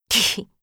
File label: laugh; Eternal Return; Hisui; KOR